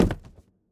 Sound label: phys cube md; Portal 2